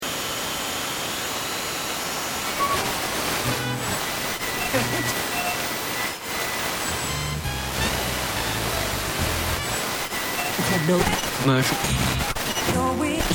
Sony Brick Radio TV Tuning, Radio or television tuning, static; short bursts of various broadcasts; Noise Sound Effects